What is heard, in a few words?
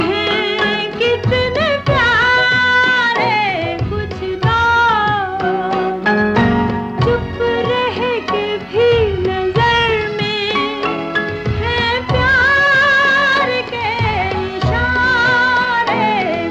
Female